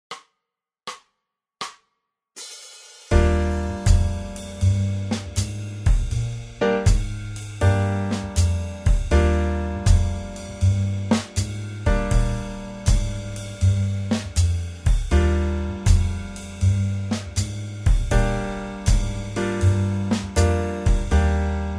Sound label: Scale; Tomosax